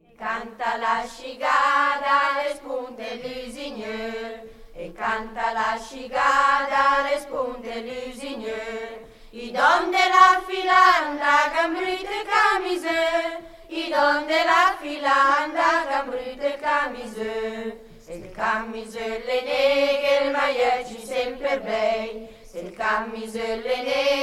canta la scigala (coro); brianza

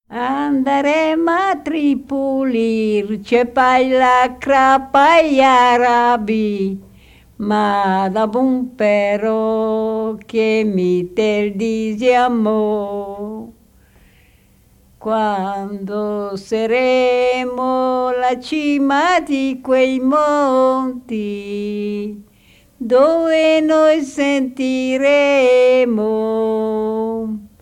Andarem a Tripuli; brianza

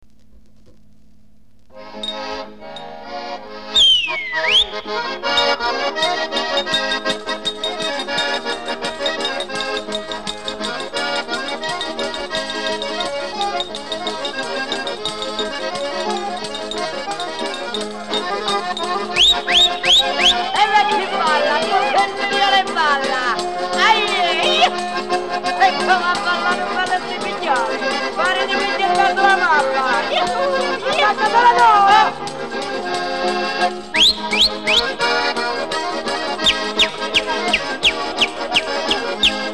TARANTELLA; calabresi

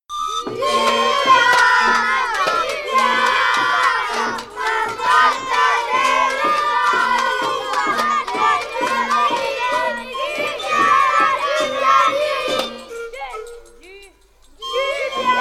flauti di pan e bambini; brianza

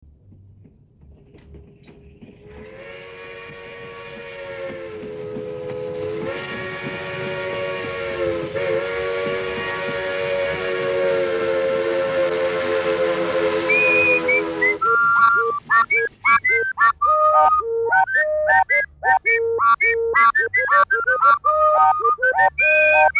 polka; FISCHIPOLKA

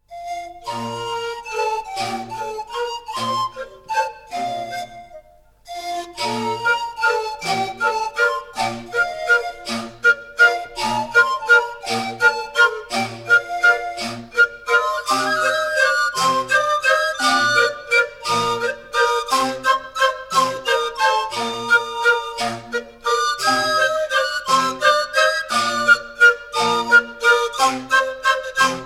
flauti di pan; brianza

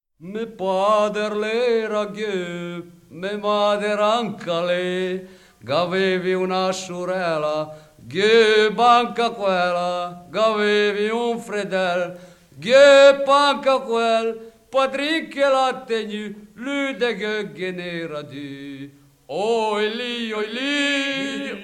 me pader l'era geub; brianza

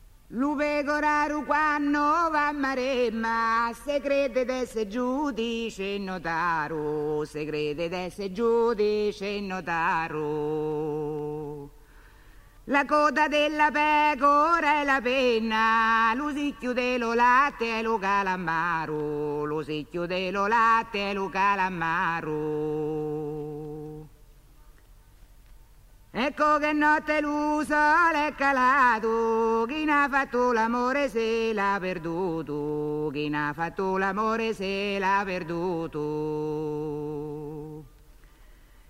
canto per saltarello

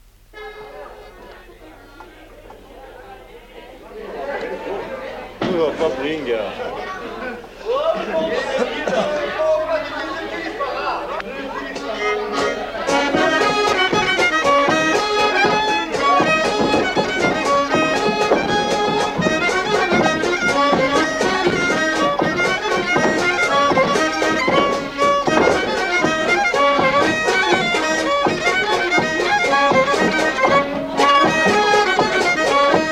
violino fisarmonica batteria; france

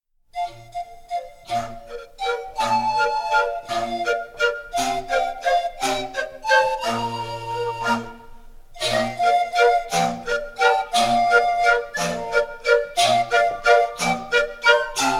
firlinfeu; brianza

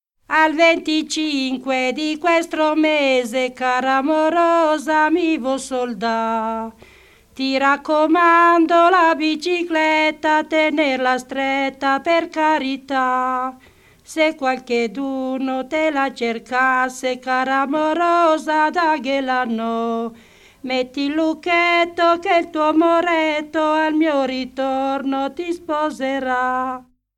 al 25 di questo mese; brianza

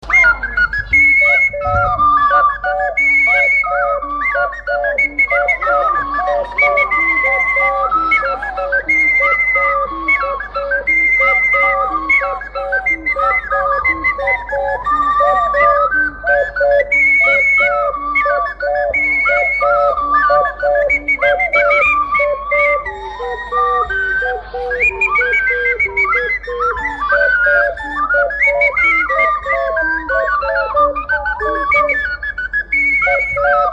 VALZER OCARINE

